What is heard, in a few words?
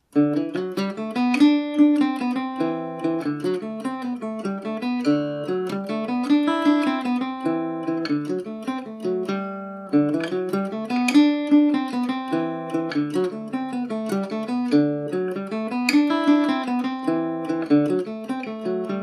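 jig G major at 40, G and D pipe drone; Ashbury AT-40 tenor guitar with uilleann pipe drone and my G&O #34 layered above at the repeat; innocents; The Inncocents' Jig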